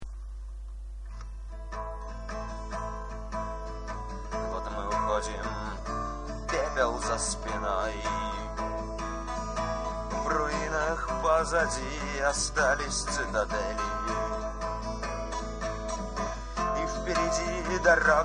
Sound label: Steel